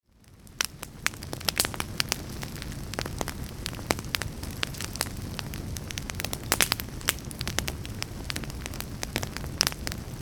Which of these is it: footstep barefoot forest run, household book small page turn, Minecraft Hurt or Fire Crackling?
Fire Crackling